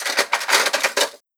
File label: Cutlery